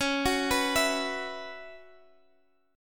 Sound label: F sharp 7th Suspended 4th, guitar